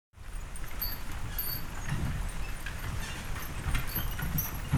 Horse Drawn Wagon By; Electa Quinney

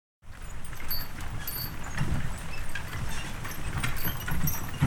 Horse Drawn Wagon; Electa Quinney

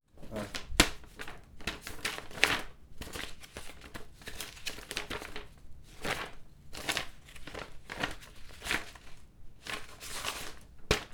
INT Handling paper pages; EFX; Poetry Project

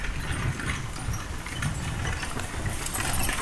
Horse Drawn Wagon By; Electa Quinney

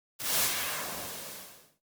Swipes; Electa Quinney

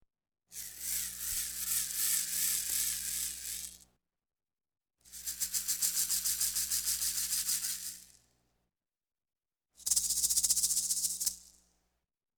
Rattles Percussion Accent; Electa Quinney

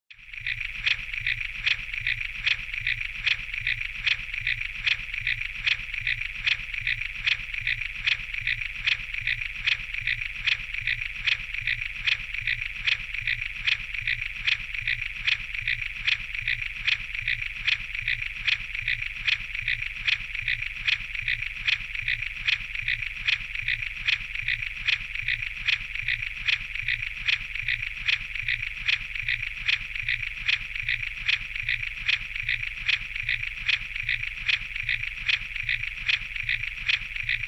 Pulsing Loop, Spinning, Rhythmic, Marbles Clanks; Sound Design

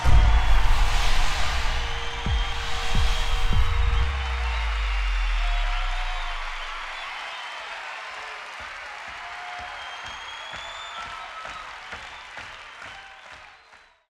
SFX; Mile of Music

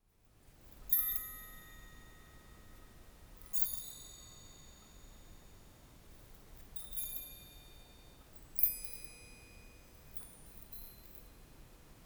INT Magical Chime

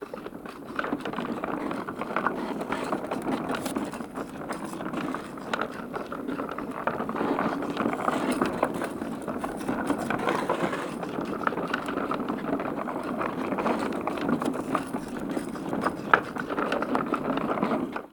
Wood, Roll, Rattle, Clunks, Stop; Universal SFX

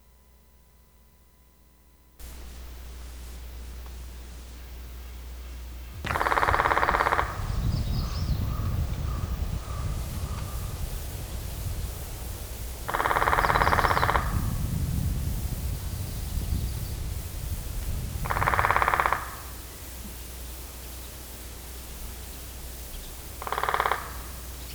Island Woodpecker

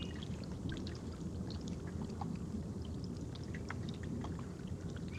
Mississippi Water; Electa Quinney

